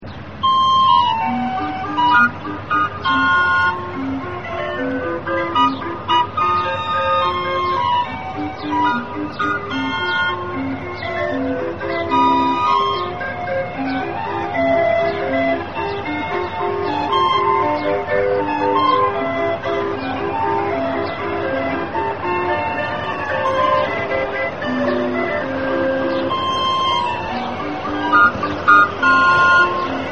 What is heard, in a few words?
calliope